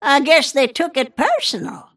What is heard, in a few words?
death; snapfire